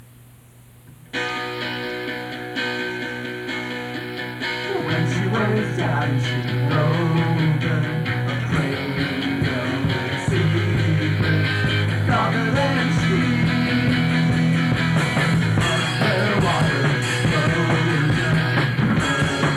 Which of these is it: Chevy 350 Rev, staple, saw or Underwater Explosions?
Underwater Explosions